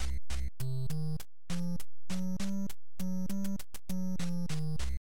name entry; castlevania

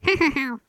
laugh; whack-a-mole; melonJS

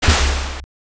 ally water attack

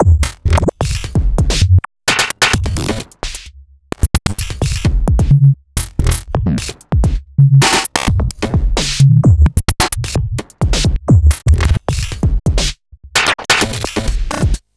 beat a

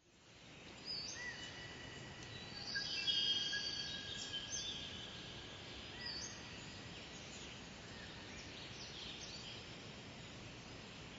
birds

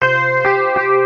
chime

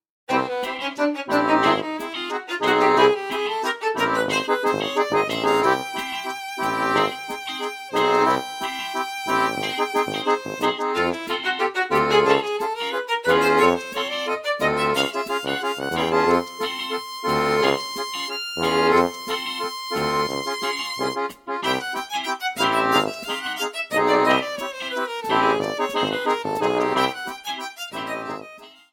Calm; bgm-library

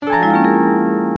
gong

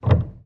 piano

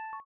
question bell

